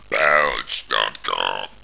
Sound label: belch